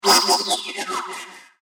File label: A Blast Of High Magic; Magic Sound Effects; fantasy sound effect